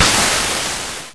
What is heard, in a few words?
NOIZE HH